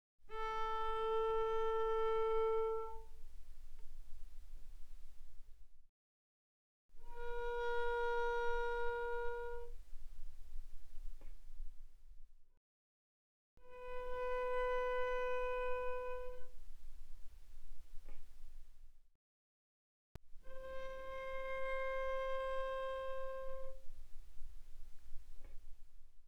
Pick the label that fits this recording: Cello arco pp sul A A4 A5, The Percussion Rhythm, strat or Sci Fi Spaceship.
Cello arco pp sul A A4 A5